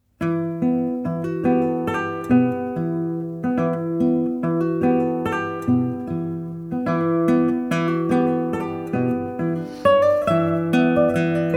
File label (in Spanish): guitarra